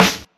Crunchy Steel Snare Drum F# Key, snare drum sound tuned to the F# note